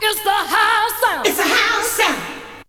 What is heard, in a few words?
VOC; ZERO-G; Datafile